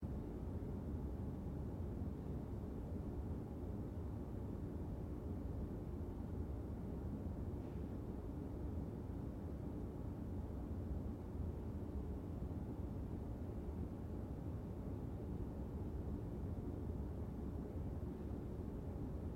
asylum bathroom ambience

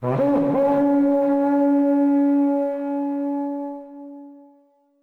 Kudu Horn D3; Civilization Revolution

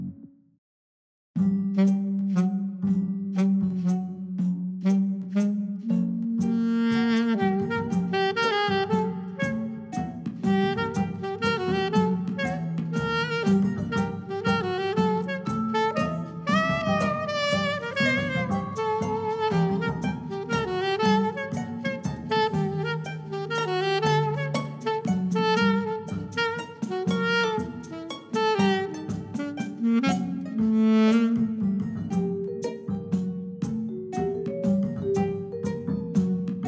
Waltz; Leisure Suit Larry Reloaded; Fire Escape

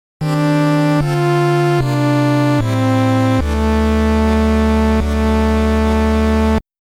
Appearance Jingle; Samus

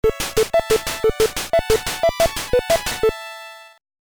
Arena Victory; Advanced Dungeons & Dragons; Hillsfar; NES

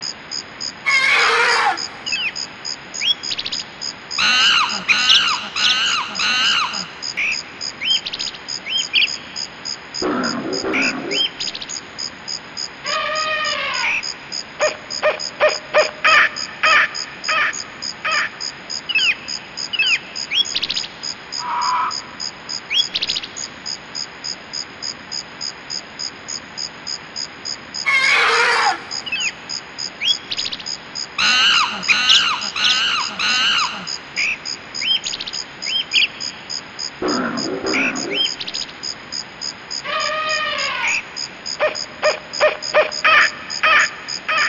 Jungle Ambience; Donkey Kong 64; n64

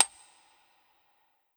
saved

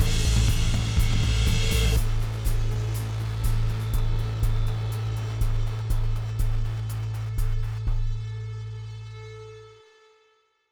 Used Cars Phrase B Outro 122bpm; battlefield hardline; ps3 ps4 windows xbox 360 xbox one

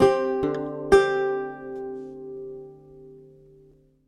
Lute Performance; Lute Anim Performance

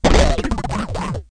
machine

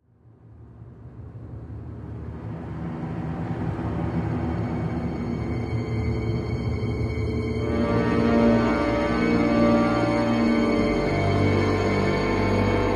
Tension; Darksiders

